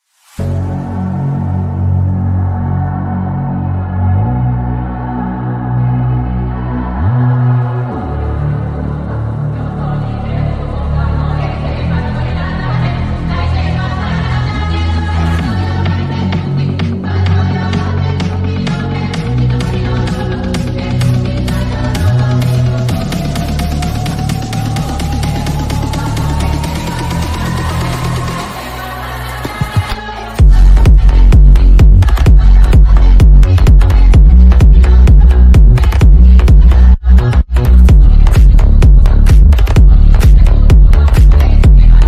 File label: Flute Ambiance